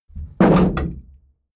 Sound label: shield drop wobble